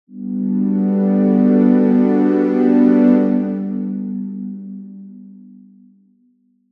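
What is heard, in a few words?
Trance Pad, jup8; Columba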